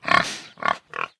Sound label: Pig